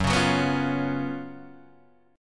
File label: F sharp Major 7th Suspended 4th; F#M7sus4 Chord {2 4 4 4 2 1} strummed